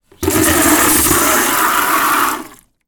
household water spray into plastic bucket short fast